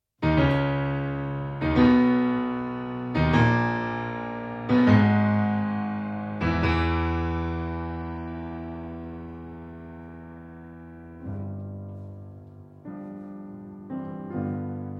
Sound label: Piano Sonata No. 3 in B Minor Largo